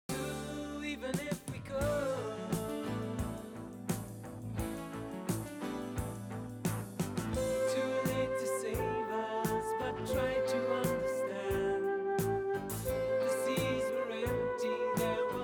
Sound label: Flute